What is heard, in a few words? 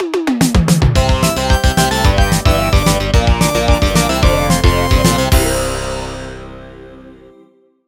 Dungeon Completed; Adventure Time Hey Ice King Why'd You Steal Our Garbage